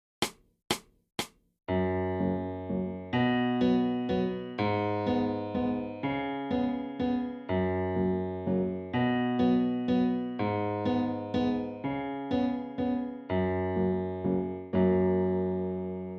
black note improv

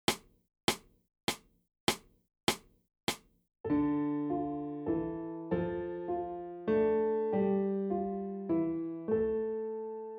WATER SONG

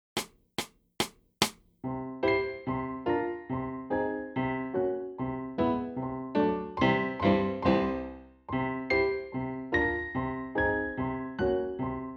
Piano Tuner

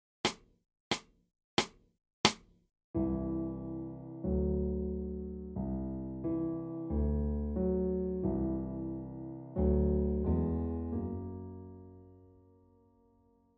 WHOLETONE

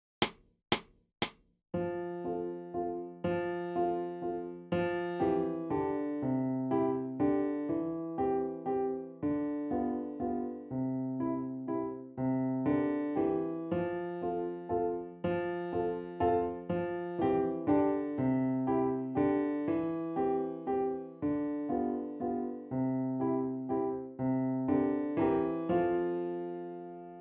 MY LEFT HAND SONG

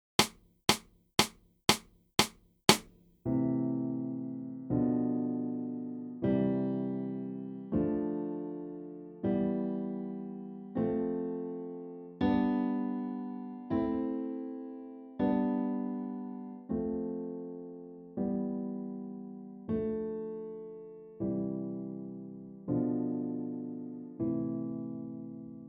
LH TRIADS

